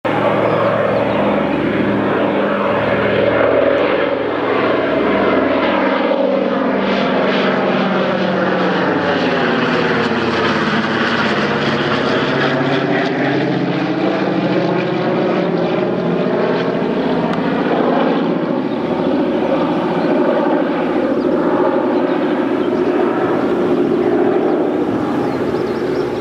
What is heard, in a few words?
dc 3 fly over; plane; plane story dc 3